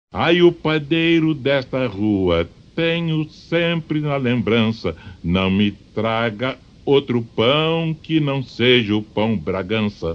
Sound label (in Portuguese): Radio Primeiro Jingle